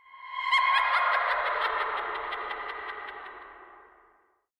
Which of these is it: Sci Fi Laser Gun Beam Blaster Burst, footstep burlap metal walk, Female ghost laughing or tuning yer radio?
Female ghost laughing